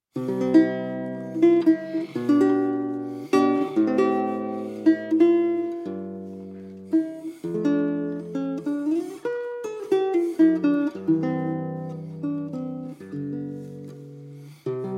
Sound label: Guitar Sonata Larghetto